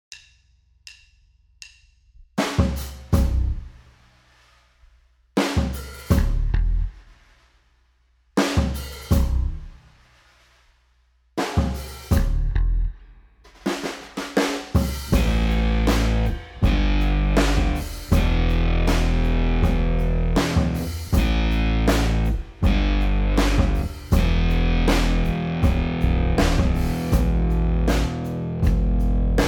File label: Slow Brush Blues